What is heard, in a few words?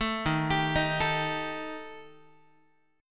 Eb Major Flat 5th Eb Guitar; EbMb5 arpeggiated; JGuitar